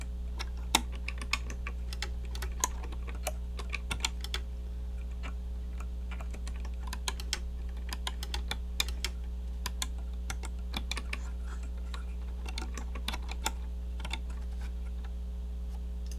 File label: typing test; Svalboard